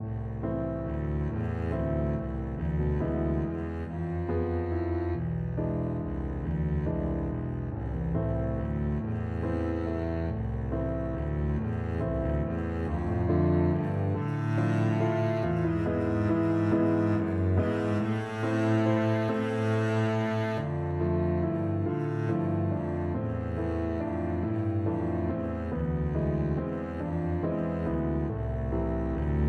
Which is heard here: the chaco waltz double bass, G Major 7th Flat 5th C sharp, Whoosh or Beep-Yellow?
the chaco waltz double bass